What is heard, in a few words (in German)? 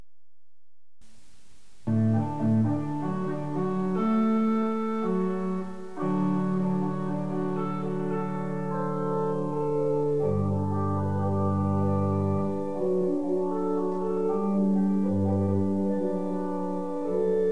Präludium Orgel